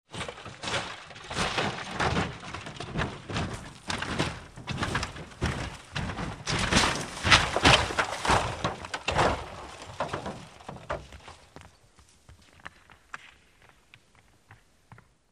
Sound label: Vehicle Falling Dow